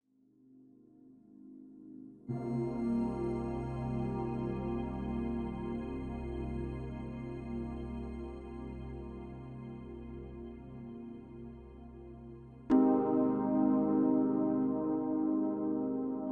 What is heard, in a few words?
ambiente